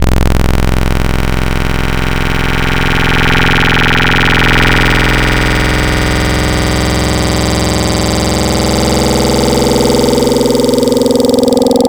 Demon Insect, wavetables; Cymatics; Nightmares Vol 2 Wavetables; Serum

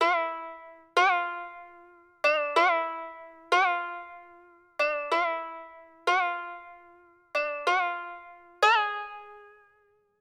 Koto, Hip Hop Exotica, 094 Emin

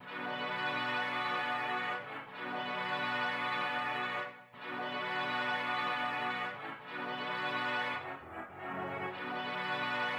106 BPM B Min Brass; Cymatics; Destiny Gold Expansion; Exotic

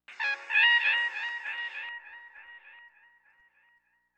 Stab; Thirty-One Flavors

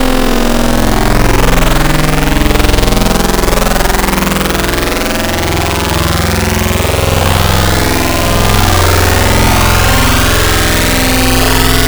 Spectral Mod, Wavetables; Cymatics; Wavetables Collection Vol 3; Serum

